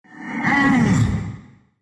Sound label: pain, ru; dota2